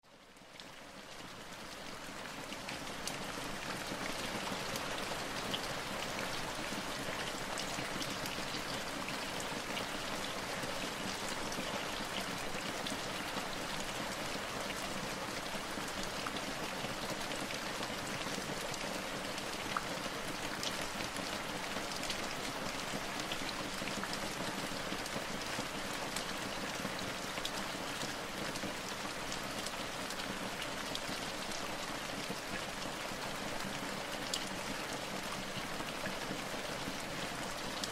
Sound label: Rain